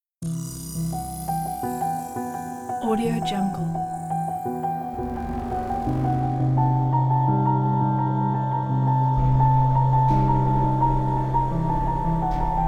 scary

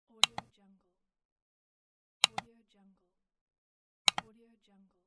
classic mouse click

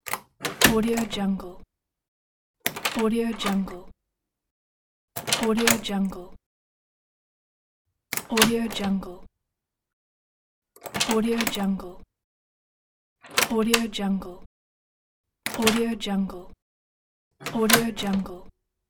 opening and closing door

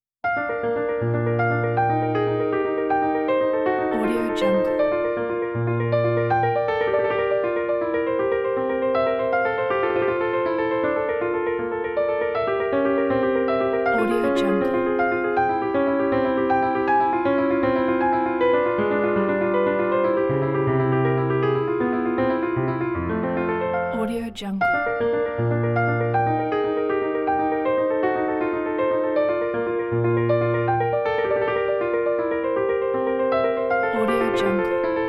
gigue in b flat major; bach j s

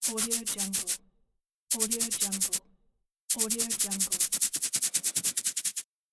the body itch

